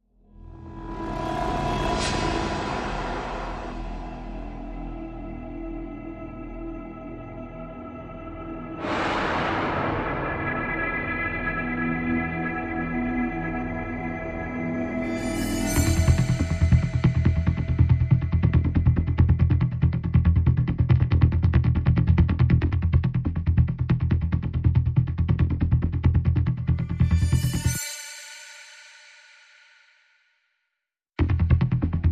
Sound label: Pads Pulses and Rise